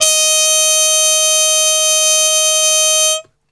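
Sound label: D#3, Brass, trumpet harmon mute; Best Service; Brass Super Section; TRP HARMUTE; 90 s Sample CDs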